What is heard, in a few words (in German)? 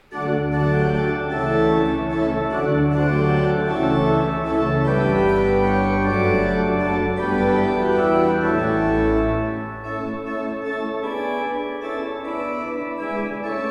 Hammer 22 II P, orgel